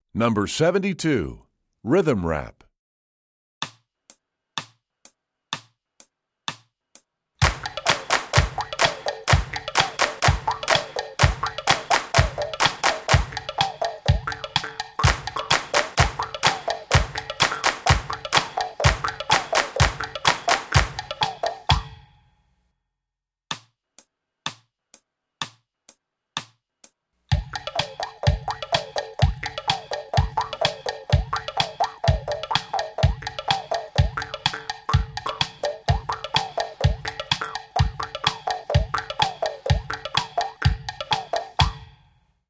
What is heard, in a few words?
Rhythm Rap, Strings; EE2000 Book2